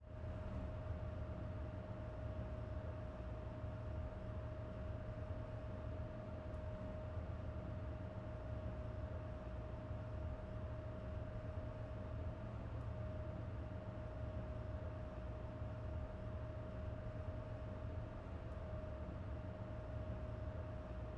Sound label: Elevator Ambience